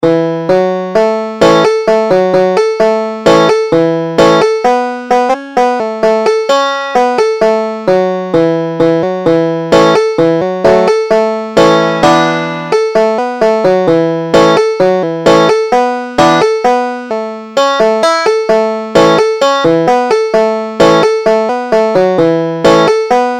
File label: down to the river to pray, banjo